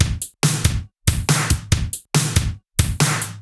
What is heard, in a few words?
Break Beats, 140 BPM